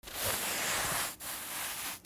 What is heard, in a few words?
FRICTION